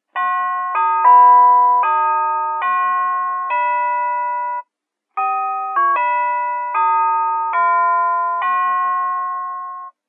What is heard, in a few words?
star spangled banner, door chimes